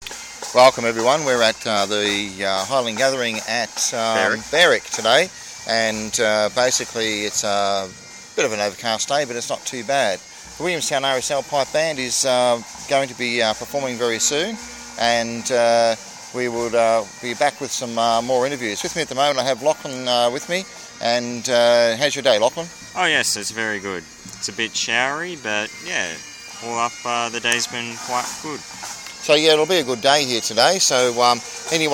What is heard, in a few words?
pipe band; willianstown rsl; at berwick